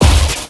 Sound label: arc emitter fire, weapons; Stellaris